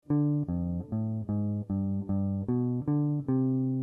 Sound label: bass